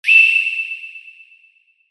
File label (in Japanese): シャキン 輝き